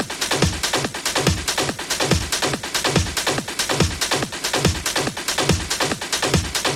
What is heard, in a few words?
DRUMS 142 BPM